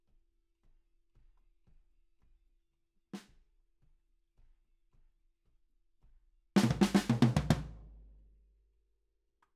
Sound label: Ride